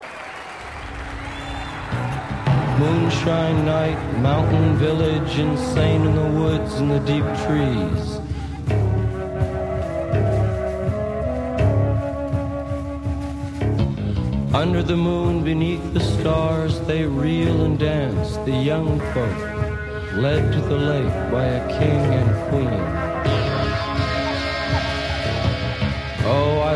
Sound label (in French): chant mouvement